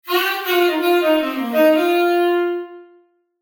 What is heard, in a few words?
Hall Reverb Tenor Saxophone Wet; Echo Chamber; Echo Chamber is a stereo reverb and delay plug-in; Syntheway